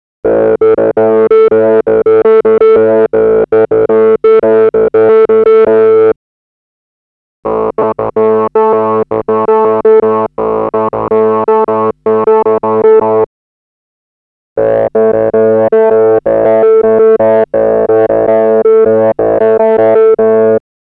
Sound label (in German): vergleich resonant solo